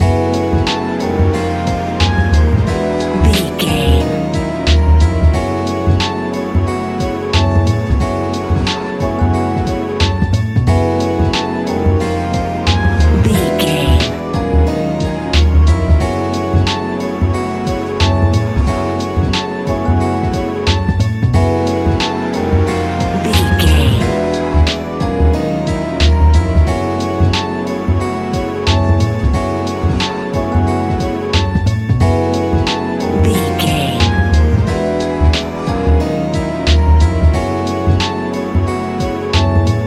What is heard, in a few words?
phantom waltz, A relaxed and chilling piece of music